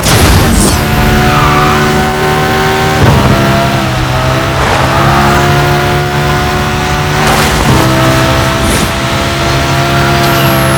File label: Turbo on loop, vehicles Caterham; garrysmod